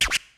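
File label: HIPHOP KIT, DRUMS; Kid Nepro; Elite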